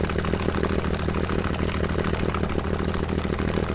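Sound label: me109 cockpit right, engines; War Thunder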